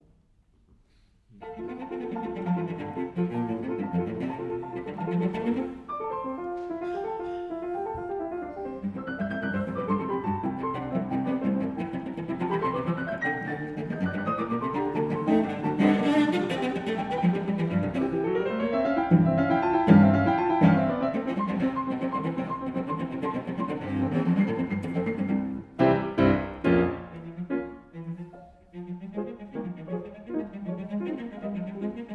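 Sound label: Cello Sonata Op 6 Adagio, live recording, cello; Barber; 古典音樂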